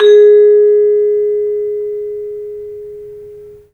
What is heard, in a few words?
vibes; 3D Sound; 3D Keys VOL-1